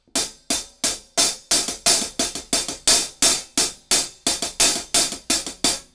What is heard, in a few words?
Cascade hi hat boost resonanse; elysia